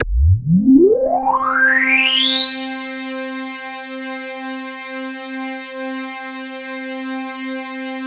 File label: KORG DW8000, Vintage Keyboards; Big Fish Audio - First Call Vintage Keyboards